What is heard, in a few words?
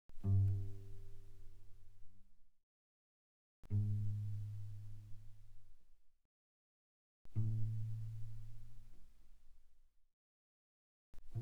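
Cello pizz pp sul G G2 Gb3 stereo, pizzicato; Strings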